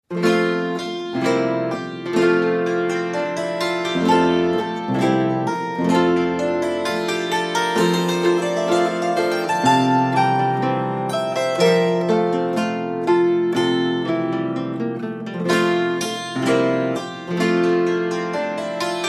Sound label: Andante